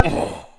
"ugh"; hedgewars

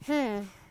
"Hmm"; hedgewars

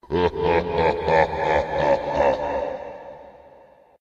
Hellish; hedgewars

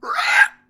Birdy Lay

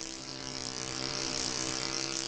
rc plane; Hedgewars

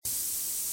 steam; Hedgewars

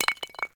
frozen hog impact; hedgewars

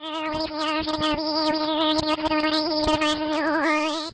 bee; hedgewars

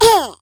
Ooff; hedgewars; Default ru

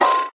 invulnerable; hedgewars

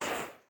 jetpack boost

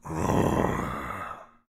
hell growl

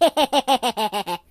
Laugh, Mobster voices; hedgewars

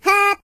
Jump, Singer; hedgewars

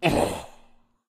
hell ugh; hedgewars

